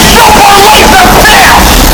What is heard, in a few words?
super laser piss loud